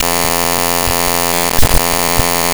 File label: Rhythm